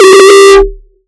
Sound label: lovely kick g#